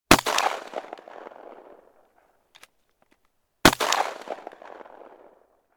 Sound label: gun model 12; still north media